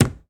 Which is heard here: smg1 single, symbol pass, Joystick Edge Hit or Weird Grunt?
Joystick Edge Hit